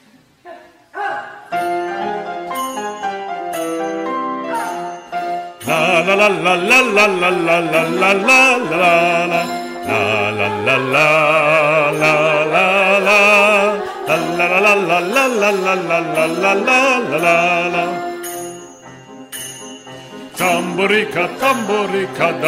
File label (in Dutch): tambourica bas en tenor